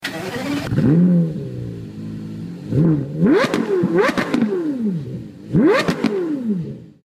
porsche carrera

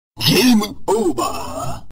game over

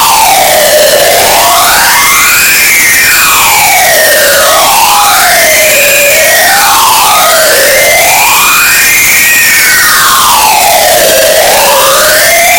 real asmr

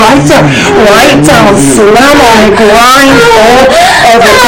slam grind loud

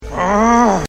grrrrr

